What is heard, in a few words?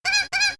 Penguin Squeak